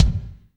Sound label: BD, DR BD; Best Service Gigapack 2